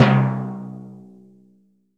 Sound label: TIMPANI C2; AKAI S-Series CD-ROM Sound Library VOL-5